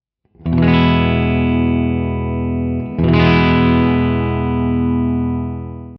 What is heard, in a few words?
E Chord, guitar